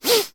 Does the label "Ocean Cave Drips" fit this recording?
No